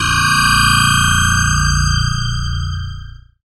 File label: FX Dance